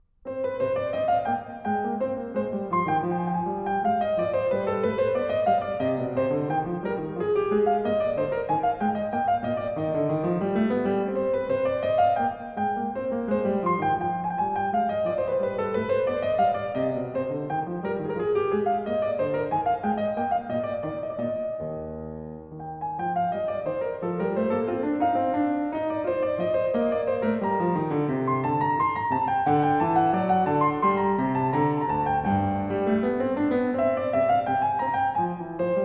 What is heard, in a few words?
French Suite #2 In C Minor BWV 813 Air, KLAVIER; BACH